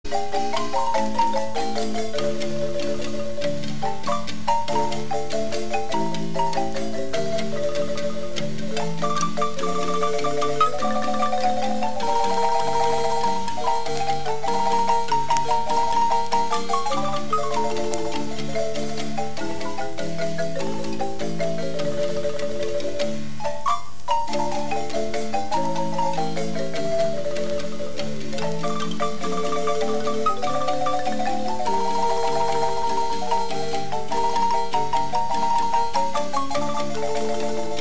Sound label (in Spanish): marimba, musica guanacasteca